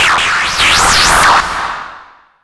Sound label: CARTOON, Hard & Loud Techno; USB Soundscan vol.01; AKAI